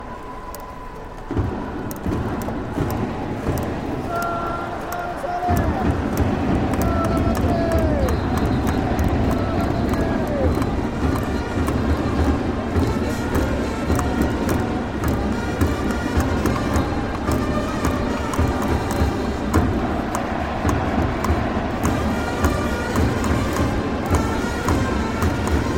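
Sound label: Japanese baseball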